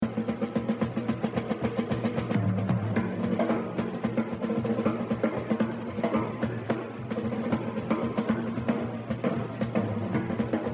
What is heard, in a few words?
Exercise, Jazz Drumming; The Evolution of Jazz Drumming (Gottlieb)